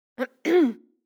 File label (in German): raeuspern